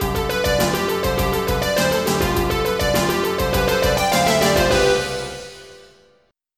TECHNO

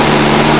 jeep idle; rtfire

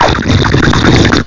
sipping; Human

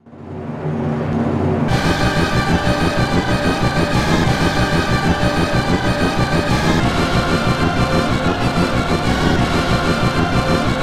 synthetic symphony

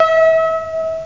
speedup; dosgames; breakit

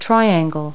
triangle; Multi-Lingual Talking Picture Dictionary

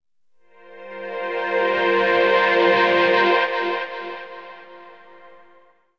synth pad ambient 160 C; Sphere

